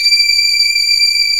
HIGH STRINGS

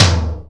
tom D#; Greytsounds; ADD-ONE KITS

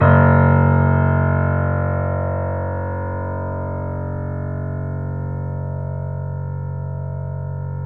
F#, Boesendorfer, 3D Pianos

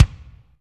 BD, SR16 drums; 90 s Sample CDs; Best Service

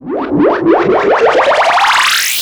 Sweeps Up, Effects

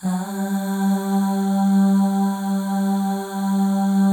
AHS, Symphony of Voices, FEM

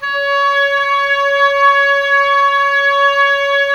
OBOE; Woodwind Brass Ensemble; Miroslav Vitous

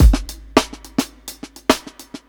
16TH BEAT, breaks, drums; Basement drums vol 2

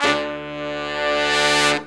brass longs, Brass Sections, Horns

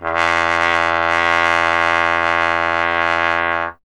Trombone E; Miroslav Vitous - Solo Instruments VOL-1

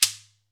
Ahfuche; Club-50 - Foundations Roland; Ahfuches

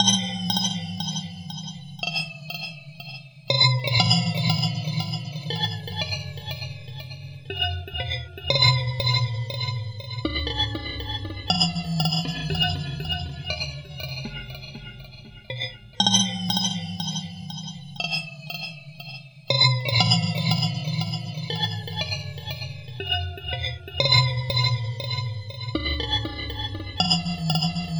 Succubus Percussion; Sonic Foundry (Sony Creative Software); Loop Noir

